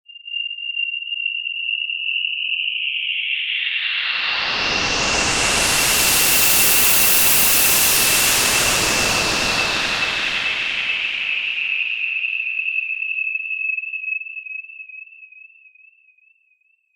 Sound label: Pink Noise Effix Delayed; Techno